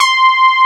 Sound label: SB HORN; Wizoo - Powered Nord; STEREO LAYER